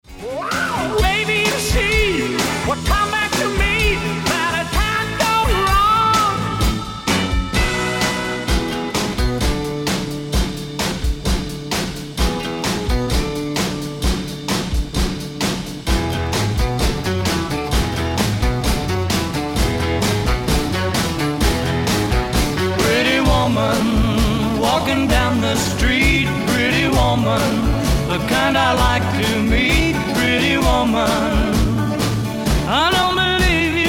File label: 60s Classic Mix